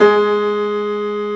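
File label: reverse G#2, piano; AKAI S-Series CD-ROM Sound Library VOL-2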